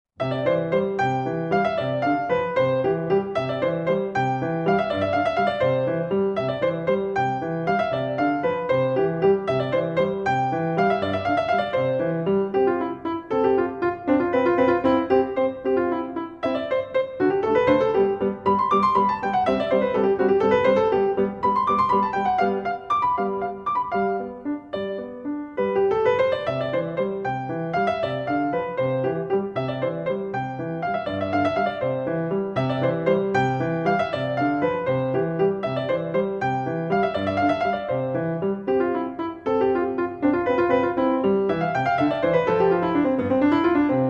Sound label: Sonatina in C Major